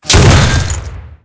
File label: tank hit he small; tanks; War Thunder